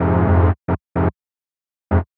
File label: 110 Fm Angels Breath, Melodic Loops; Electronisounds